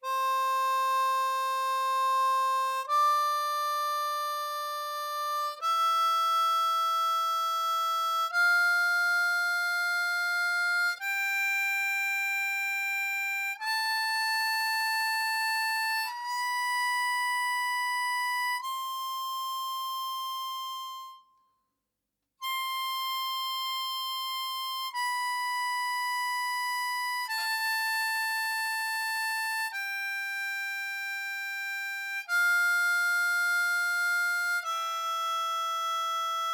C Major Scale, Central Octave, Slow & Fast, Blues Harp